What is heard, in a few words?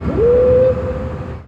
move order fleet; Hearts of Iron IV Death or Dishonor